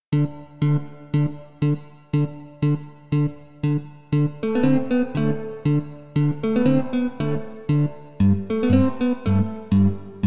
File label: Fantasy Game Music